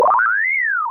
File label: robot